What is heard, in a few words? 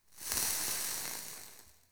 Invis Rune Active One Off; Powers; Runes